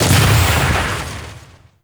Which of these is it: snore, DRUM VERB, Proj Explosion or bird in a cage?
Proj Explosion